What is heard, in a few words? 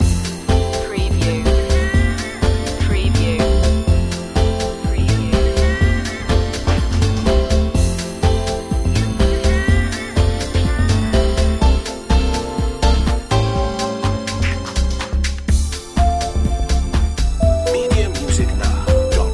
vintage beats loop; 1990s